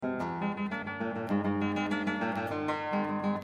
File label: Bluesy